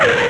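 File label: tire skid, transportation; Web Clip Empire 150,000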